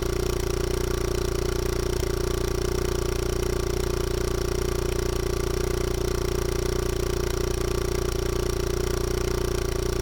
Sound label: 3500rpm